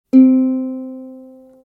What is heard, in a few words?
uku c, accordeur